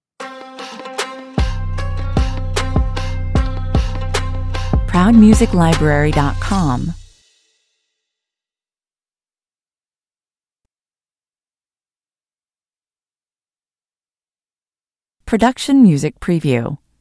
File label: east west trap stinger